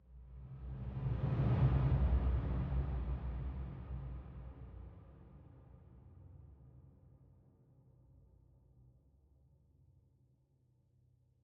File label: Desert Music, Desert Outro Swell Extended